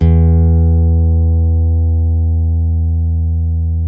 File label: STEEL, Guitars; Spectrasonics; Hans Zimmer Guitars VOL-1; PARKER STEEL